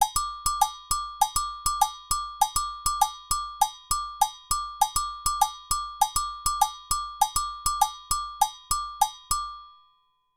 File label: baiao agogo